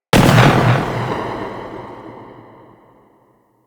Skiff Drone Close Explosion; Explosions; Archwing

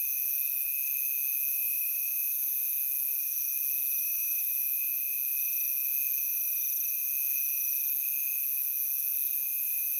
Night Insects High; Ambience; Props; Warframe; Eidolon Plains

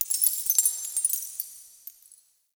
Metal Debris; Warframe; The New War; Zariman

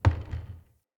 Wood Stool Impact Light

